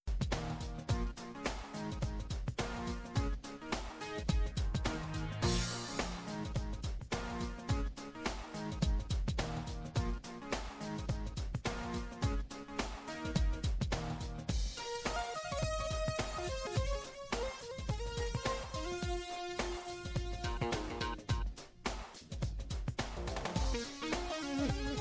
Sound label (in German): levelmusik; jazz jackrabbit 2